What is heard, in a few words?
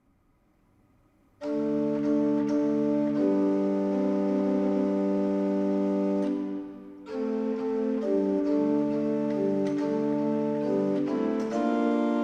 Alleluia III (Instrumental); The Krasiński Codex (Fifteenth-Century Music from Cracow)